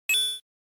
coin

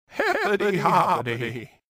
hiphop; cave

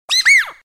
yahoo

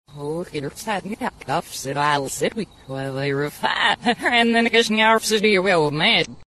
piss loud; reverse

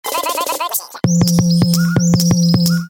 bird machine

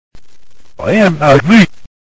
mail; reverse

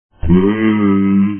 bowl; vapesquared

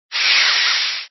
crowd applause

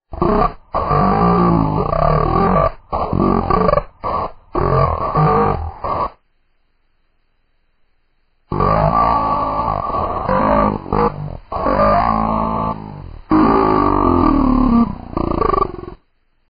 bomber; vapesquared

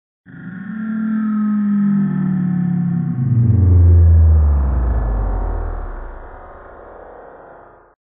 ooofff

